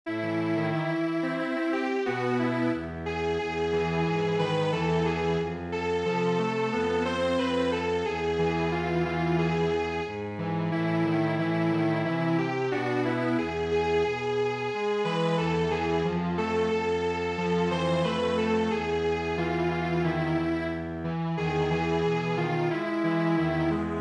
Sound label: Strings Quartet; Hava Nagilah